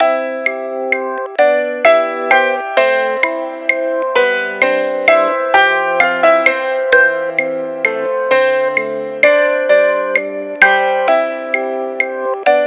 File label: ringtone